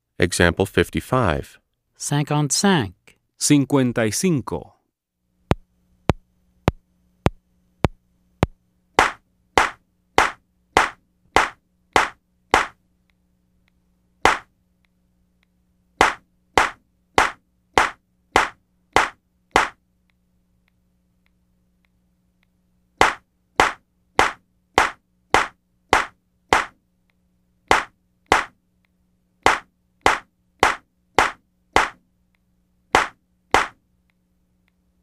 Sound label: Keyboard